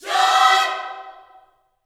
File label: JOY CHORD, Vocal Gospel; Spectrasonics; Vocal Planet Vol.1 Gospel; akai